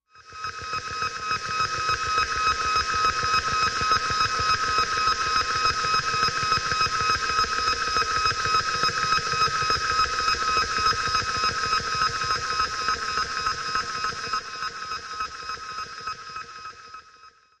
Hydraulic Ejector Mac, alarms

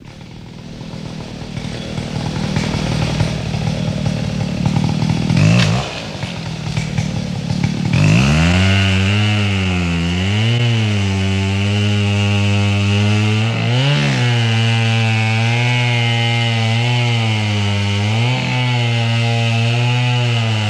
Chainsaw Cut Down; chainsaws

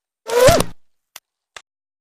Bullet Impacts Wood I

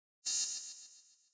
Beep Delay Read Out; beeps

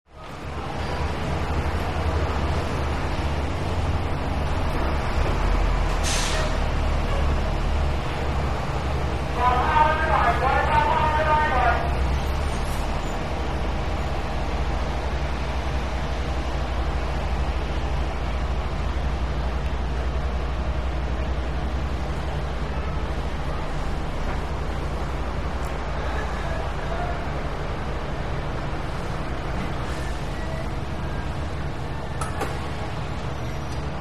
Industrial Ambience, warehouse & sound stages

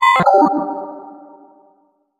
Computer Button Press, stinger

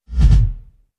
Bass Impact Double

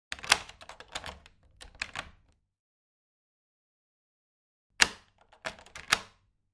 Keys, Lock, Unlock A Wood Door Double x2

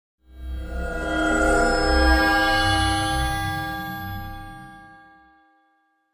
Glass Feedback Shimm

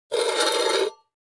Coffee Can Scrape On; barrels buckets & cans

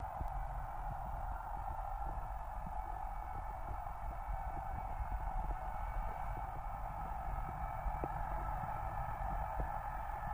Torpedo Jet By, torpedos

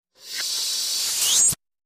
Strange Reversed Air Releases Vary In Pitch

